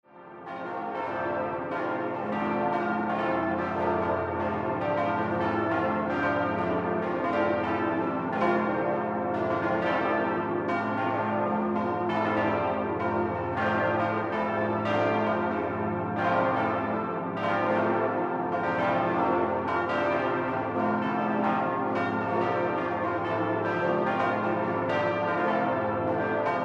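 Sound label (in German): großes geläut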